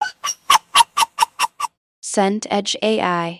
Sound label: chicken cluck